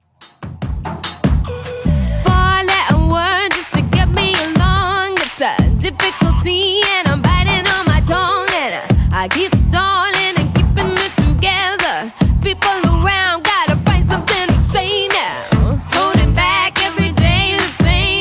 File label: 145bpm; 2ks DJ Friendly Edit